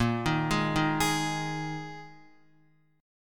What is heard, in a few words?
Bb 7th Suspended 4th Bb Guitar 4F,4A,5C,5G,5C,6E; x,1,3,1,3,4; Bb7sus4 arpeggiated; JGuitar